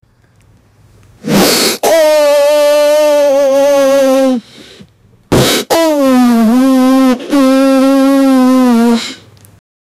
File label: with a cold, sick